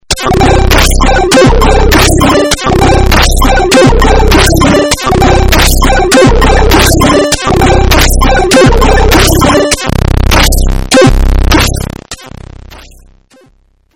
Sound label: chipwave example